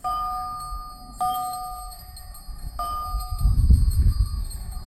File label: the distant ringing of a, school bell